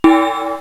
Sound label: points; urbanrunner